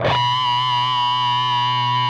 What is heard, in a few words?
PRS C 3E, PINCH Guitars; Spectrasonics; Hans Zimmer Guitars VOL-1